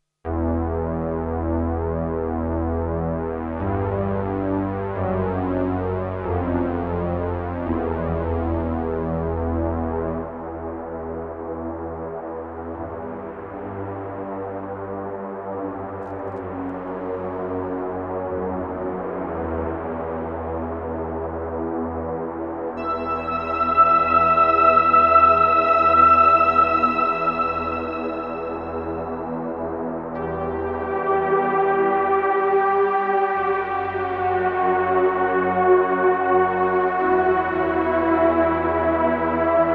Strymon Big Sky Bloom Synth

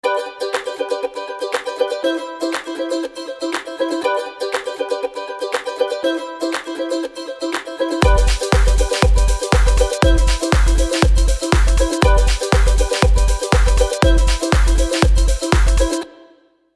Ethno Dance

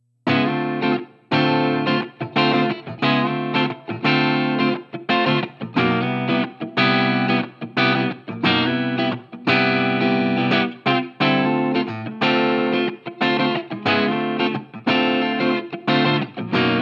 Line 6 Pod Go Wireless Amp Clean; Ventoux

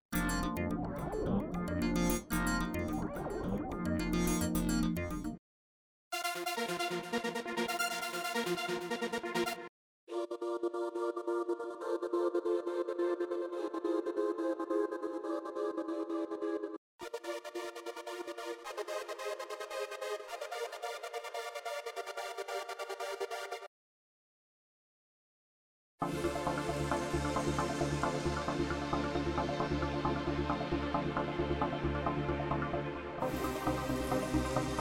Arpeggios

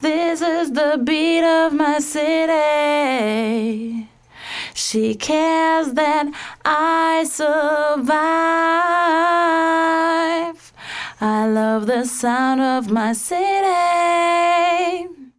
WA 2A Vocal Compress

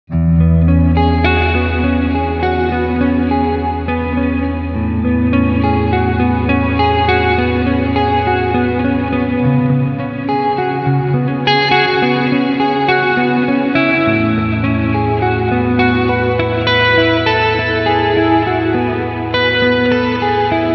Clean Hall

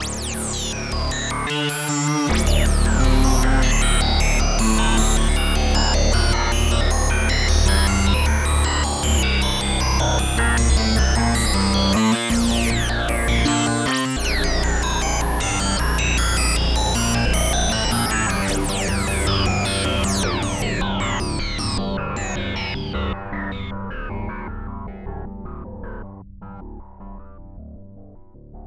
SH Chaos; Super Gemini

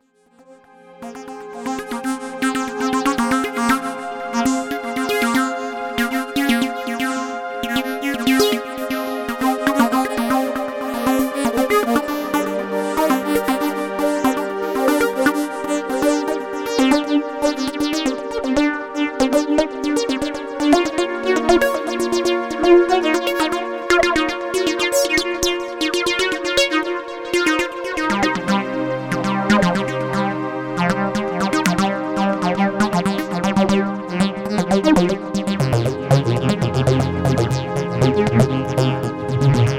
cs60 new mix

